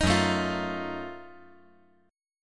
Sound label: F sharp Minor Major 13th; strummed; JGuitar